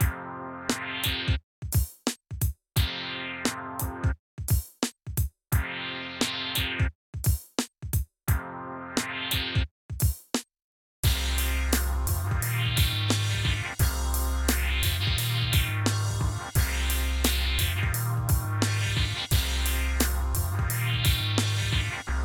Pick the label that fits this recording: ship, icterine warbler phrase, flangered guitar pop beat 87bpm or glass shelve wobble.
flangered guitar pop beat 87bpm